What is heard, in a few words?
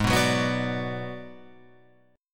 G sharp Minor 7th Flat 5th Guitar Open F, G#m7b5 chord; strummed; JGuitar